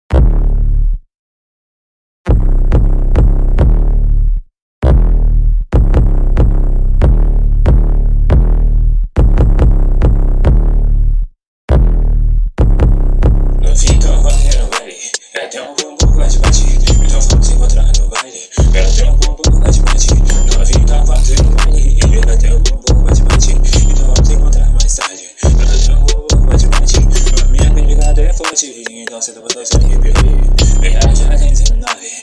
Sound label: hip hop